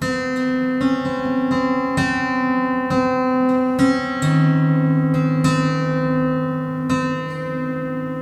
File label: Detune, Piano; Piano Destruction